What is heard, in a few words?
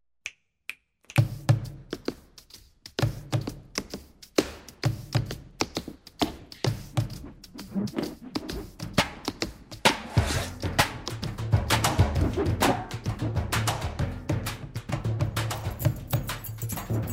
Stomp, phonetones; GM205